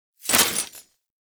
Mech Release, Rifle; Thanotech; Trumna Prime

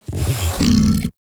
Vocal Breathe Fast; Warframe; Hunting Critters; Undazoa

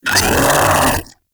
Infested Splitter Joined Vocal Attack Medium

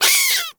Forest Rodent Vocal Pain; Warframe; Characters

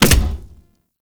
Step Default; Warframe; Corpus; Arachnoid Hunger; Steps

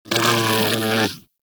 Armadillo Vocal Snarl; Warframe; Characters; Hunting Critters

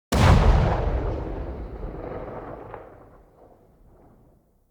Siege Engine Cannon Tail; Weapons; Enemy; Artillery; Ode Siege Engine

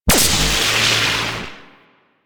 Corpus Powerplant Tesla Coil Hit; Ambience; Warframe; Corpus Railjack; Tether Power Plant

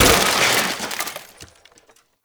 Wood Table Impact; Warframe; Ambience; Nineteen Ninety Nine

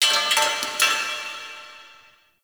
Metal Debris; Warframe; The New War; Zariman

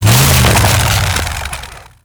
Saw Forward Combo Rev; Warframe; Grn Ghoul Saw Player

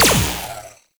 Laser Skin Printer Laser Start; Warframe; Ambience; Entrati

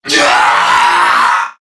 Death Crewmen; Dialog; Barks; Battle; Warframe; CorpusCrewmen; CrewmanA

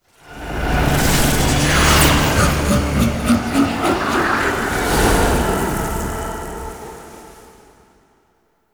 Title Card; Warframe; Duviri Murmur

